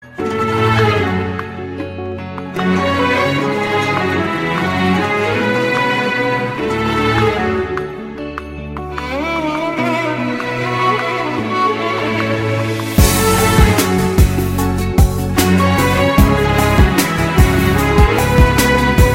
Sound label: slow and sad music